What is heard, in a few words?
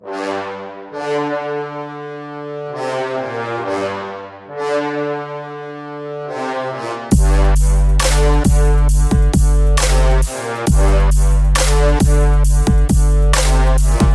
Majestic Beat